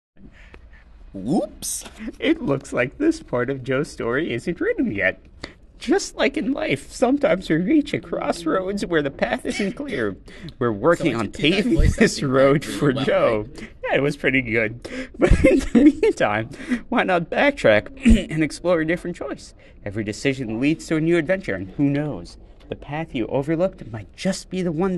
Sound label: tune guitar